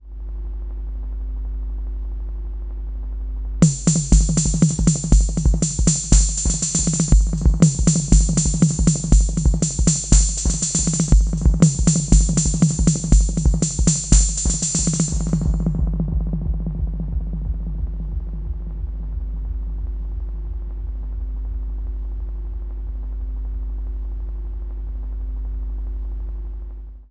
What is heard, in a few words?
Ableton Echo Analog triplet Dub